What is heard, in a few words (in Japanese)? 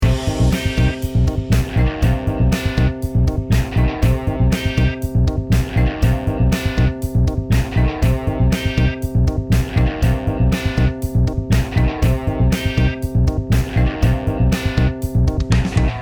Rock対戦系, rock sound